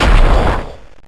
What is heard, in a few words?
Mud Bomb; PRSFX- Mud Bomb